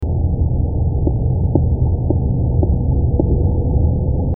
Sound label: taps